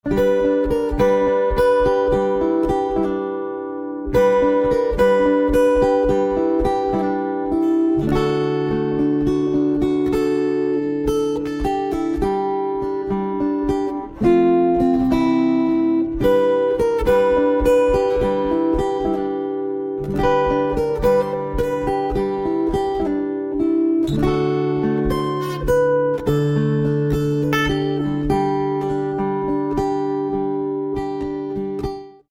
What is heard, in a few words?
Guitar, instrumental ringtones